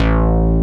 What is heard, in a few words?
SELECTED BASS; Masterbits; Dance Trax